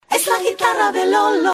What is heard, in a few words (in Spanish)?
guitarra